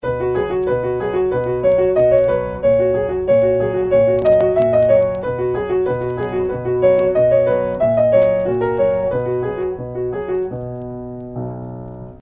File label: Mill Wheel, Pianist; Bastien